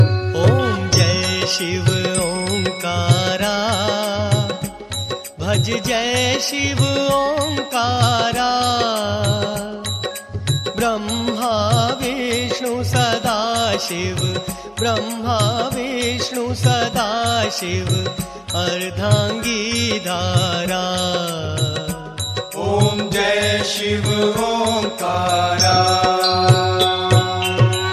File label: Om Jai Shiv Omkara Shiv aarti ringtone